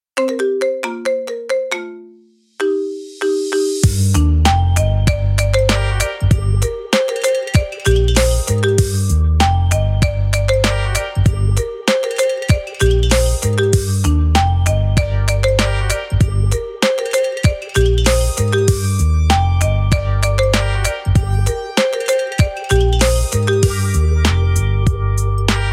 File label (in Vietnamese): nhac chuong iphone; gta san andreas